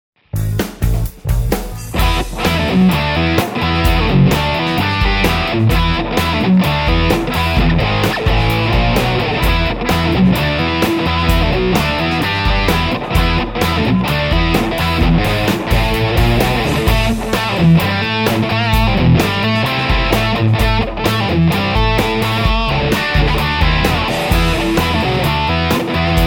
even more gain; schwarzbach; RedZoneH

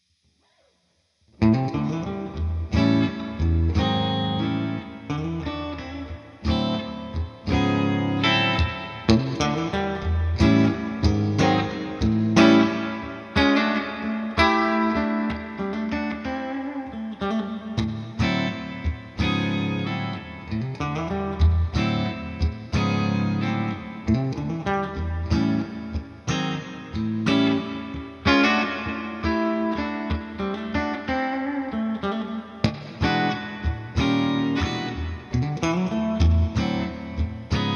Bridge Fender Twin Clean, humbucker

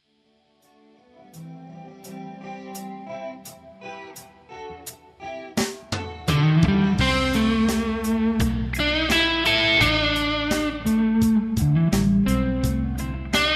dist, humbucker

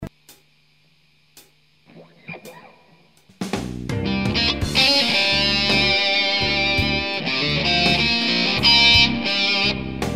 blues, humbucker; HardGen

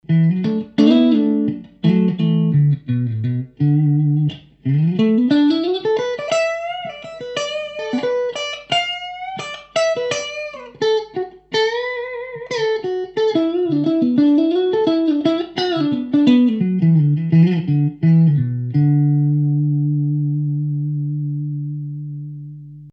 clean neck middle; schwarzbach

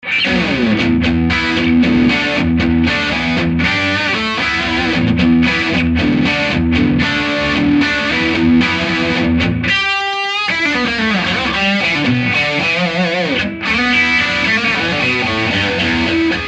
brett bridge; schwarzbach

